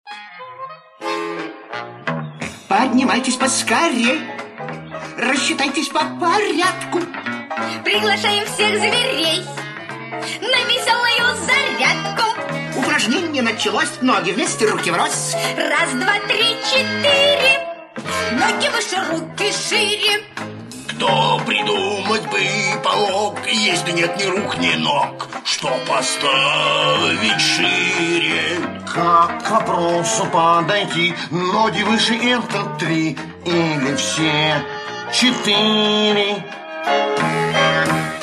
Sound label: funny exercises; 38 parrots